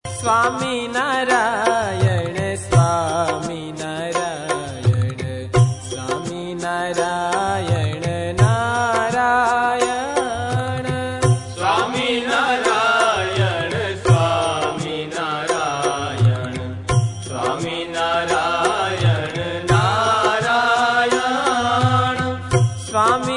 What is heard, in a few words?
Dhun; Swaminarayan Dhun